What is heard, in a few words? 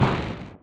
Missile Launch